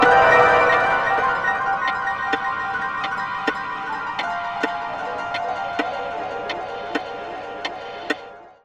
wheel deploy; weapons; dota; ringmaster

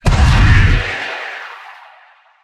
lucentbeam impact; weapons; dota; luna

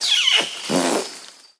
orangutan kiss; dota; soundboard

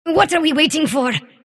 sylph move pain; dota; dark willow

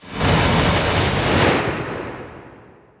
snowball cast; tusk

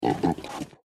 boar snort; npc; courier

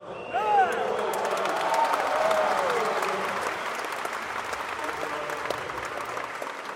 crowd; dota; spectator

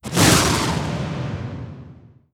glimpse end; weapons; dota; disruptor

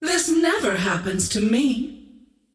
scream whiff; queenofpain

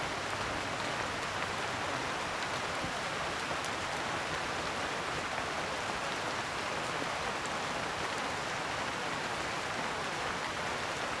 heavy rain; ambient soundscapes; dota

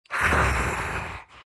big moans; Undying

